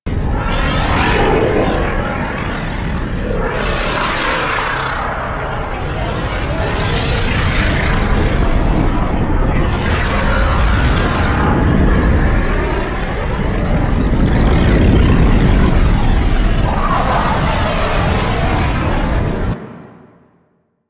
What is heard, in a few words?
Regrowth Massive Loop